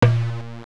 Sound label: darbuka note